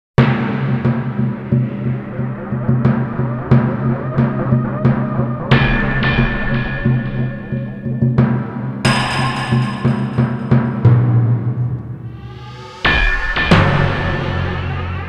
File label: angry timpani